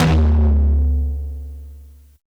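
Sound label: TOM loud; Zero-G; Chemical Beats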